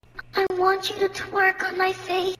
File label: twerk